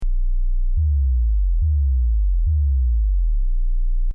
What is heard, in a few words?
bass